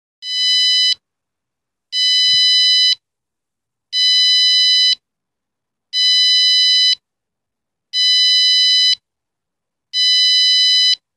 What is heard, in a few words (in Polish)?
buzzer bez generatora piezo